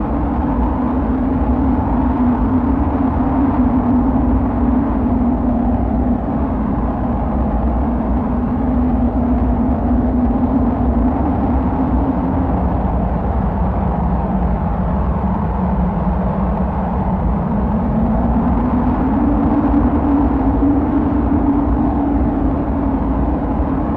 Ambience

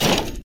Grate Door Push

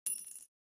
Gold Spawn

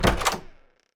Door Open

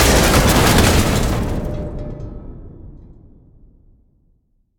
Gate Destroy; Grumble

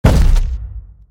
Figure Footstep; Footstep SFX

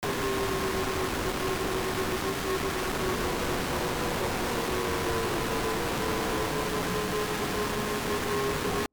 Ambush Scream; High Quality version

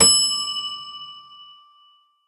Elevator Bell

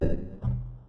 Door move (retro)

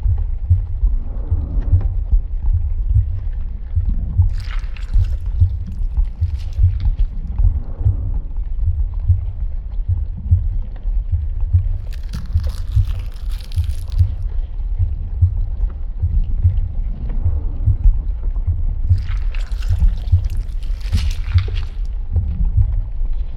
Egg Ambience; Gloombat

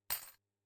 Door Key Unlock Unused

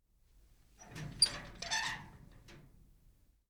Gate Open Hotel

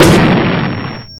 Boom

